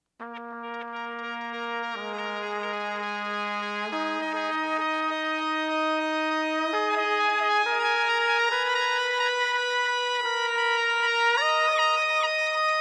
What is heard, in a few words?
Crystallizer w: Delay, harmonizers; Pitch Manipulation Pedals